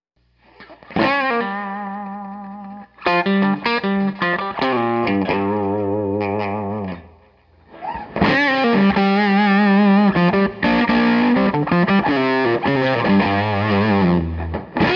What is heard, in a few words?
eg neck